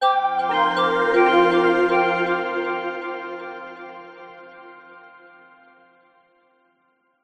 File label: multimedia logo musical short warm delayed soft corporate; zapsplat